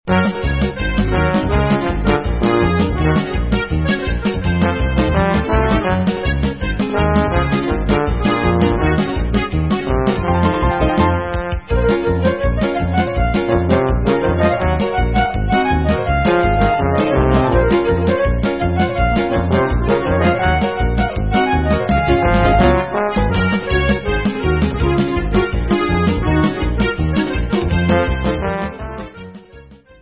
Instrumental

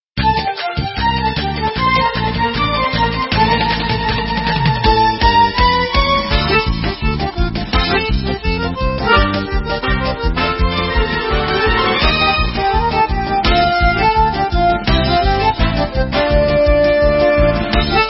Hora, Instrumental

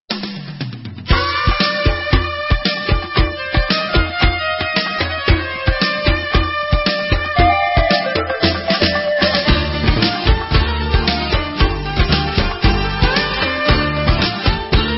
Debka, Instrumental

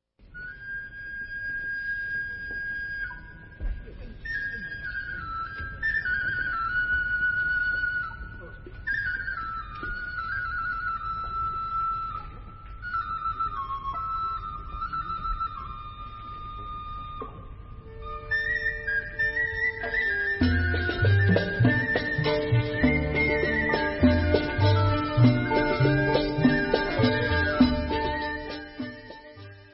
Debka Raqsat Al Manadil Instrumental; Debka Chayim No.2

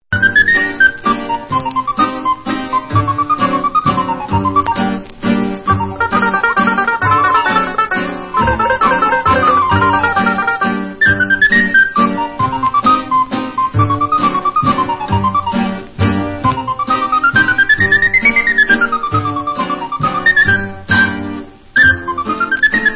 Mazurka

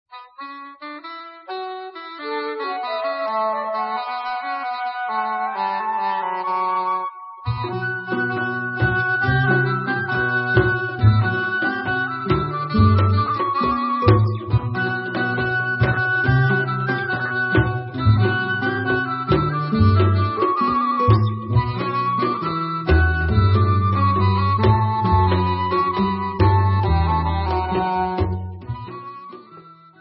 Instrumental

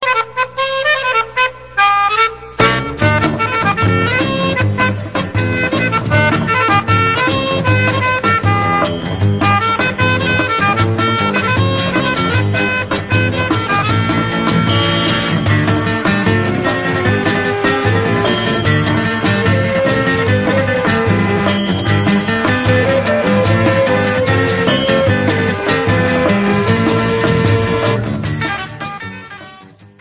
Instrumental

